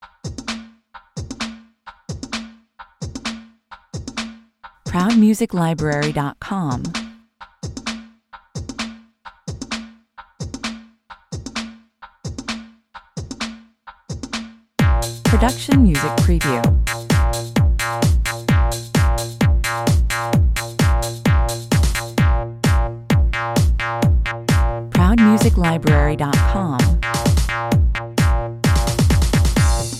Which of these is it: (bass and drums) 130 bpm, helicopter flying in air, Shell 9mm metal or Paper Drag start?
(bass and drums) 130 bpm